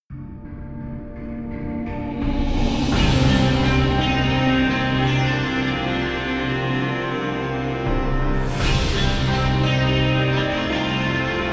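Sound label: Music Dark Victory No Lead Guitar No Horn Melody; StarCraft 2 Wings of Liberty; MissionMusic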